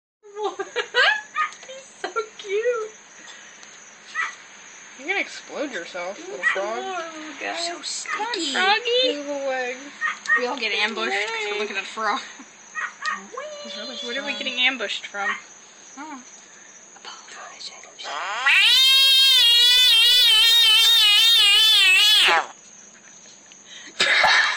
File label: frog scream